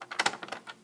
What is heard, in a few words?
record on, Tape recorder +2A; Mass storage; zxsp